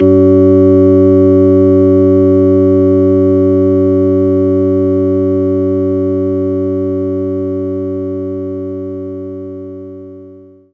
Hammond Organ; knights try

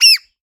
parrot

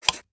Button Click

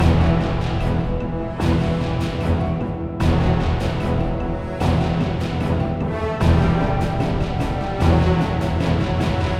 Ruins Basket Boss; Timez Attack

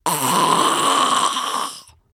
Grave Zombie death

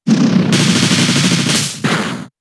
Bullet Punch; Pokemon; gen 7; SUMO; USUM

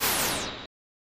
Swoosh

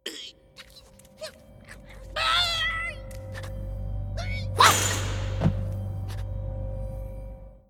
slamdunk; Hub; scrat

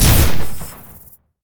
Sci-Fi Weapons-Sniper Rifle Single Shot

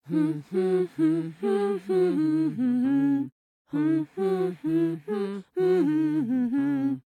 Voice song, en

